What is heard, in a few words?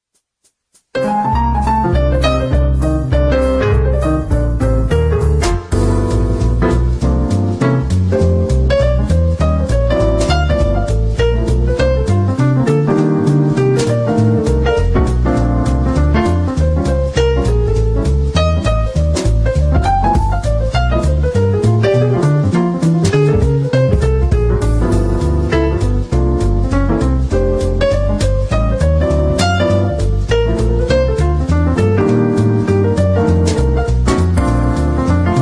jazz piano swing